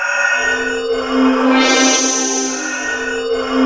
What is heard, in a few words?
HORROR; East West; Global Sounds; D50 FXS